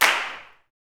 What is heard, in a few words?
CLAPS, Gospel; SNAP; ILIO; Vocal Planet